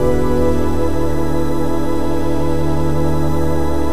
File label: 122 BPM Pads Amaj Fmin Tonic D, ambient synth pads; MMB